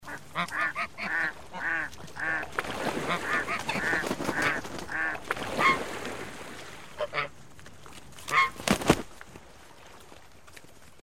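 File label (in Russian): flapping wings of geese and ducks on water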